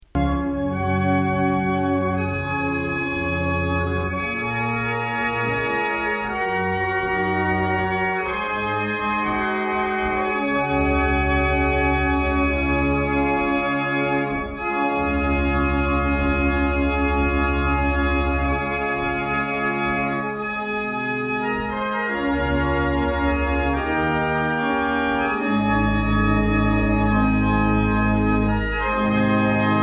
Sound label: damaged, barrel organs; Brightling